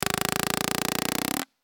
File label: Paintball Gun; Sound Ideas; Guns